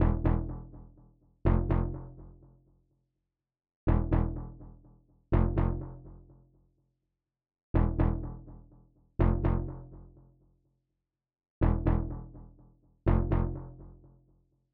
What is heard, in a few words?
124 BPM A Min Bass; Loop Stems; Cymatics; 2022 Melody Collection; One Over